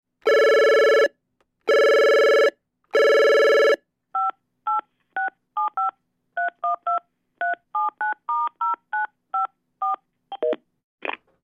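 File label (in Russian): звонок домофона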